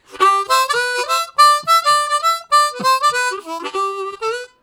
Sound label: Walking, Harmonica; Zero G